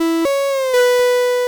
Low Fuel

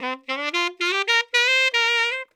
tenor sax dry

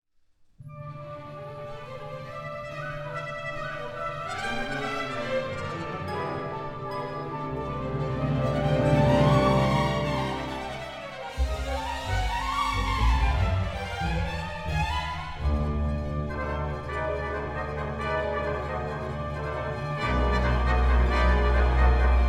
Torrent, for orchestra; Harry Sdraulig

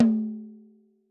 tom

